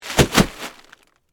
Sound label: Horror, Body, Fall, On, Plastic, Tarp; Blood and Guts; BlastWave